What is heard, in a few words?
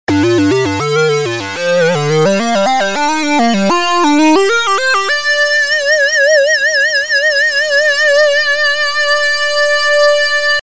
sync solo high